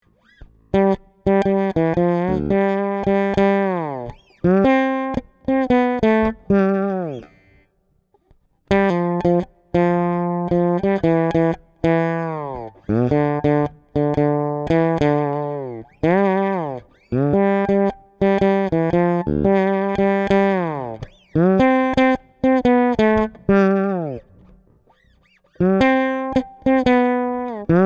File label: MELODY